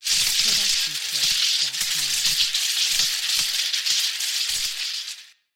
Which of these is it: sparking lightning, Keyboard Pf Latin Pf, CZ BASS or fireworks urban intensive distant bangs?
sparking lightning